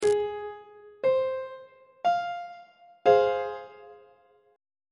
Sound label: F minor 1st inversion on treble clef